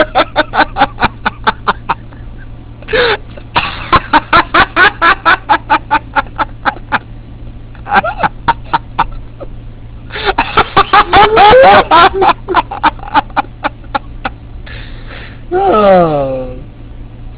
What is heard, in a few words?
laugh